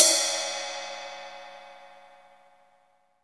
RIDE, Ride Cymbal; MONO RIDE CYMBAL; AKAI S6000 CD-ROM - Volume 3

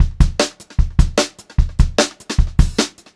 BEAT, Brushed Drum Loops

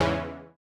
Housey Stab E2; KG MegaSaur

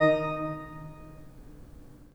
PIPE, 3DOrgans

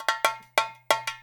FILL, Percussion

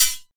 XTRA PERC

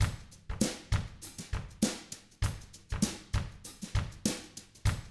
FUNKY, Drums; ILIO; Double Platinum Drums 1; 99 FUNKY 1

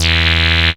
CREEPY E1, sequencer; Best Service Alpha Dance vol.2

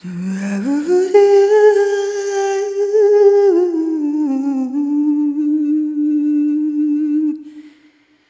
Arrhythmic G

